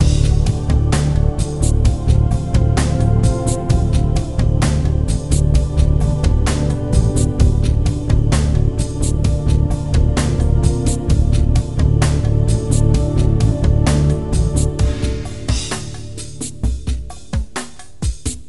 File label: sounds music; Halo Combat Evolved; Xbox